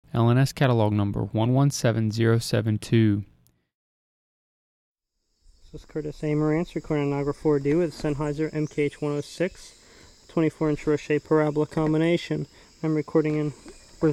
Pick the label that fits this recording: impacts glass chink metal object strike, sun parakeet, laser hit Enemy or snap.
sun parakeet